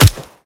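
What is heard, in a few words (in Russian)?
вражеский пистолетный выстрел шпиона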